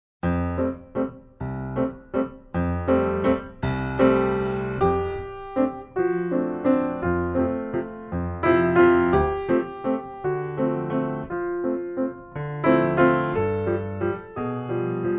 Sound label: waltz; tendu